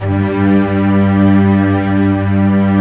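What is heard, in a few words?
orchestra strings G2; GRANDSTRG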